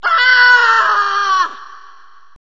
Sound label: death; alienarena; femforcer